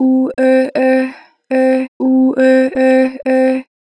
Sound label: Vocals Ooh Eh Eh, 2 bar flat; Pitch Flat